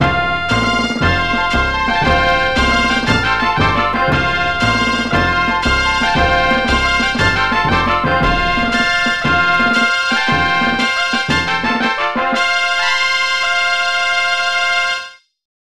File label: Victory March; Rampart; SNES